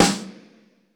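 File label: GATE SNARE; Crypton - DrumBase5000; GATE KITS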